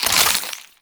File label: Beam Pistol Reload; Warframe; Weapons; Infested